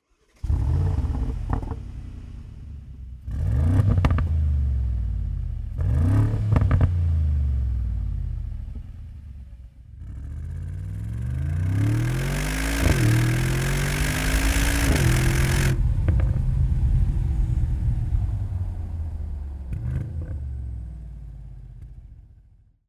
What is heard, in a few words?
Evolution Mercedes AMG G63